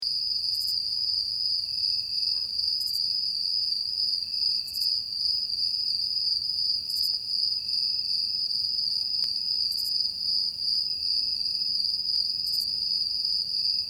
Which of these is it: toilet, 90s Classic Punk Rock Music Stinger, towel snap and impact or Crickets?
Crickets